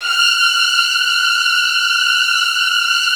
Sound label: F3, Strings, STR HLF TRLL; ILIO; Kirk Hunter Virtuoso Strings VOL-3; 90 s Sample CDs